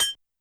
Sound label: Glass Noise L; YAMAHA RM1X